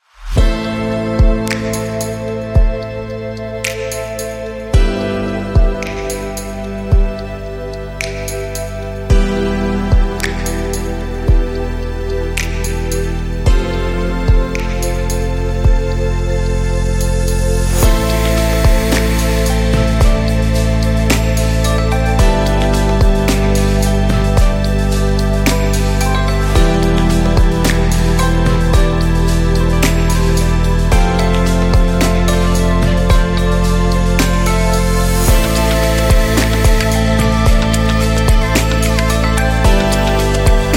Inspiring Light Atmosphere, ambience electronic music